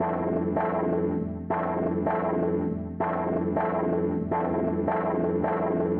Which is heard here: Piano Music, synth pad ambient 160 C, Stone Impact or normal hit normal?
synth pad ambient 160 C